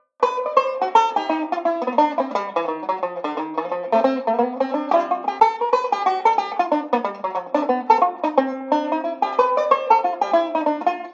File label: I V I Etude jazzy, banjo; Beyond Chord Melody Volume II